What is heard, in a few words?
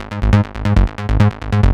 Bassline DRY 138BPM, G#minor; Bassline & Melody; Vengeance Samples; VTS1 Zodiac Kit